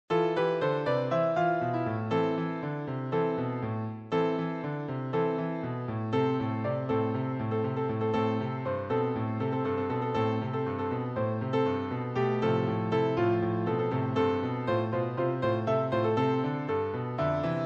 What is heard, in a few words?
Rain